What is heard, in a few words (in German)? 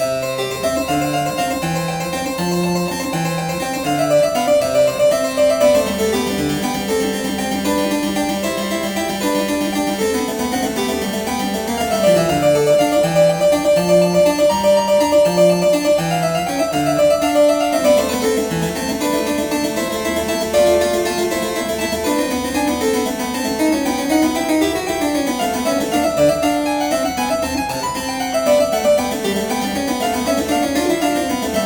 Werckmeister Cis-Dur C-Dur